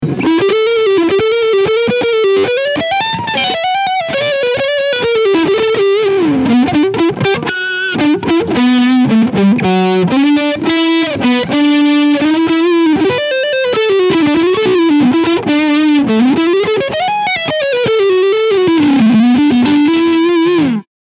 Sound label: Leadsound Front PU